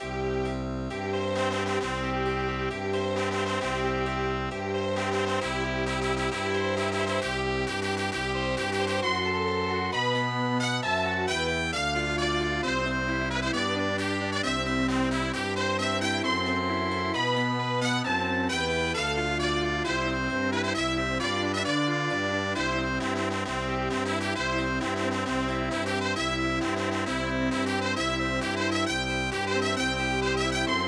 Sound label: Chamber Music